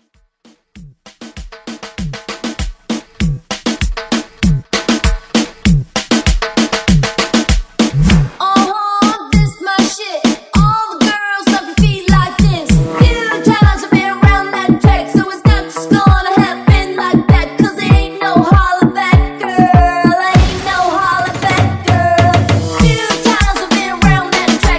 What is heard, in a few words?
Reggaeton Party Break; Reggaeton Party Break Edit